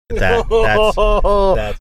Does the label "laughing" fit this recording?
Yes